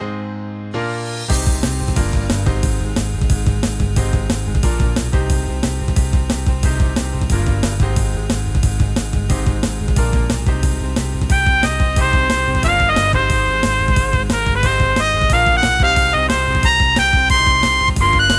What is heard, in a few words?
Jazz Fight